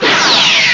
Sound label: phaser short; The Epic Collection 3; Epic Marketing; muzak samples